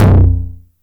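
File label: 909 low tom, tape saturation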